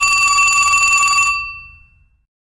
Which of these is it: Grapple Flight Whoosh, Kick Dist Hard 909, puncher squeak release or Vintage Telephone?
Vintage Telephone